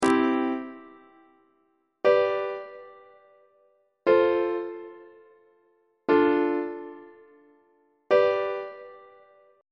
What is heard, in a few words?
c major half cadence on treble clef